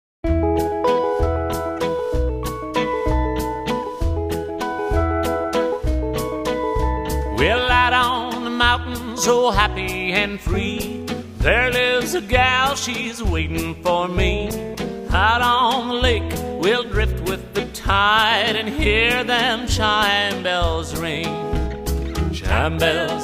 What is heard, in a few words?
Chime Bells; Yodel; TotalYodel